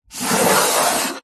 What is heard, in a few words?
wood smooth hand fingernail rub; foley; zapsplat